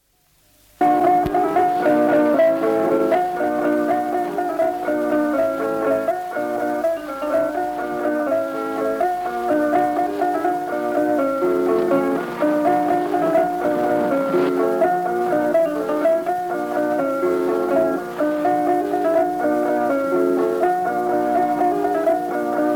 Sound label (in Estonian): labajalg, kannel, folk; Isa labajalg; Kirbla